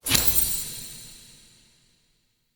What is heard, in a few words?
Animote Install; Animal Motes